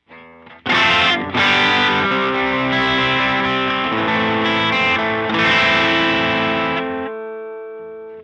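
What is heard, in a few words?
Spring Electric Guitar bypass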